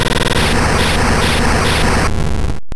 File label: Fire Spin; sfx; attack moves; pokemon; gen 2; gsc